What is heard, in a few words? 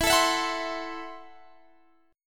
C 6th Flat 5th E